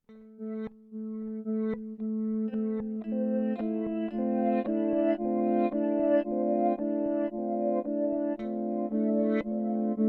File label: Mooer D7 Modverse Delay